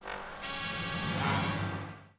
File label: Start; BTV